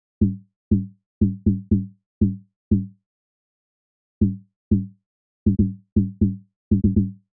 Breaks Tom Low; lmms; madmans; VariBreaks

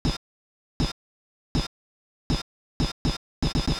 drum; hydrogen

